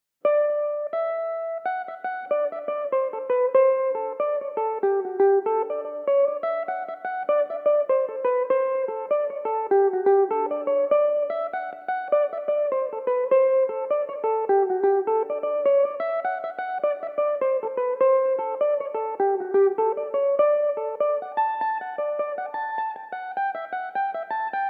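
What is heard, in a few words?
D mixolydian, irish mandolin, tunes; an t athair jack walsh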